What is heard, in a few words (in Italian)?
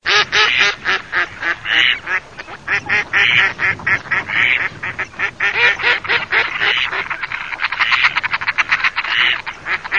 GERMANI